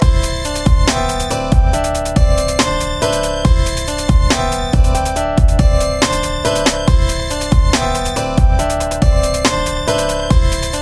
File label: Sad beat